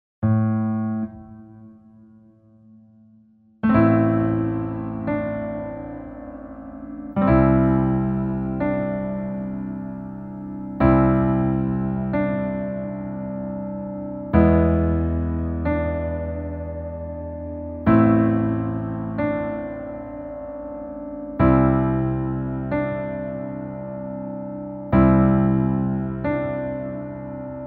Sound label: Piano ver